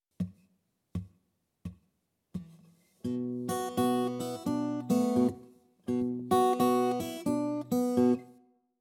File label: Open Tunings For Blues Guitar; An Easy Guide To Open Tunings For Blues Guitar